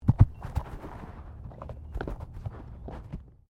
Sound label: Body movement Tumble Light; Body movement foley to simulate falls and deaths; Rolling; Objects; SoundEffects-Foundation1